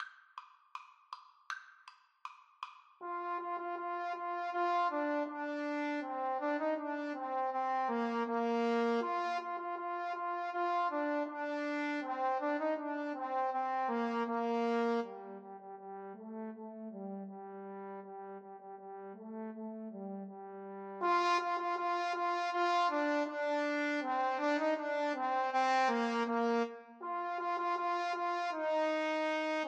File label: Trombone Duet